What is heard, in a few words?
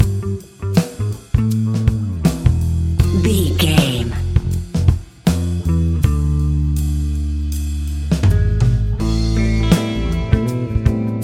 A classic piece of Hip Hop and Rap music, ideal as a beat to rap to; perfect for budding rappers